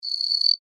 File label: Insect Voice Chirp, Vocalization, Bug; Animal; BlastWave